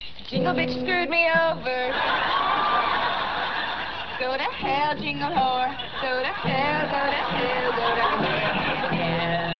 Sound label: jingle